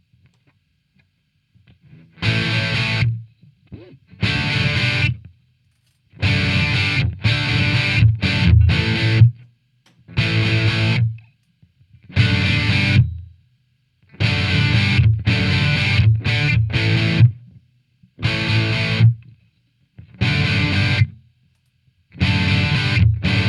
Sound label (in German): Roehrenvergleich